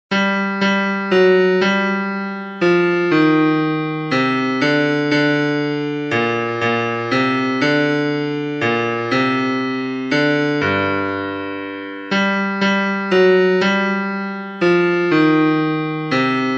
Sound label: Bass